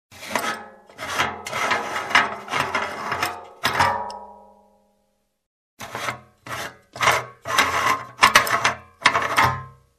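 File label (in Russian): откручивание закручивание крышки металлической канистры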